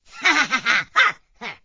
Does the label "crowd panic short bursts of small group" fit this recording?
No